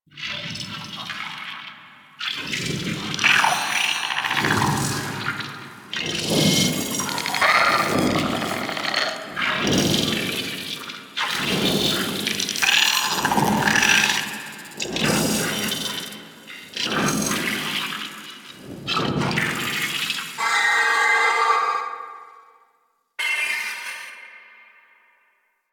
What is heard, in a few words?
Sentient Movement; Teshin Intro; Warframe; The New War